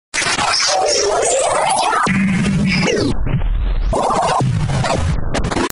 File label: WEAPONS